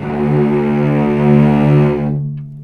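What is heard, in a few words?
cellos attack D2 R, String; East West; The Ultimate String Collection; VCS ARCO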